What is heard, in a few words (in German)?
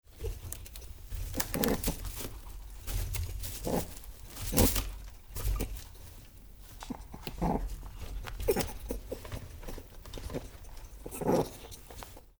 Kaninchen Abwehrlaut